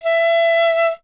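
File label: flute piccolo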